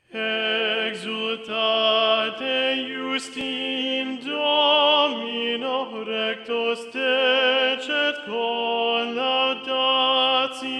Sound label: exultate justi satb tenor predominant; viadana